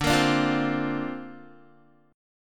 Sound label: D-Minor 7th Sharp 5th D Guitar, chord; Dm7#5 strummed; 10,13,12,10,12,x